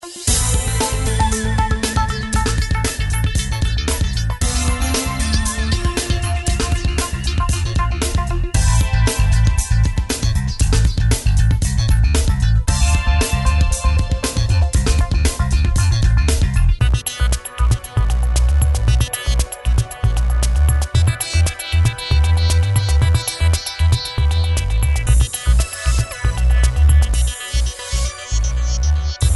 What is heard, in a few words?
electro grooves; pilates